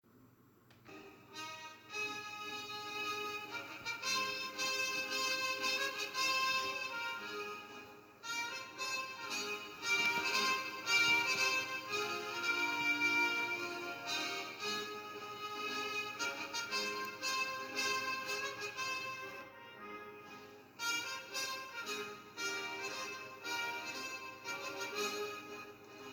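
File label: anthem instrumental played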